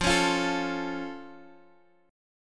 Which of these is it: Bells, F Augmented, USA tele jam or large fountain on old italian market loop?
F Augmented